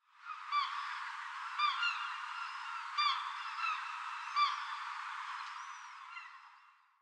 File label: ambience ocean gulls; smite